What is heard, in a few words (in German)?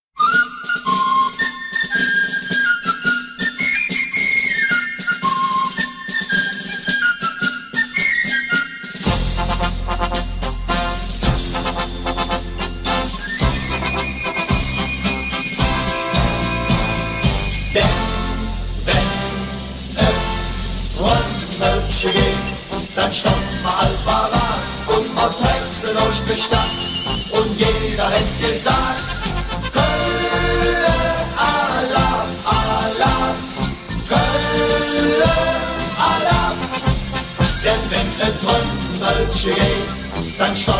Torhymne; 1. fc koeln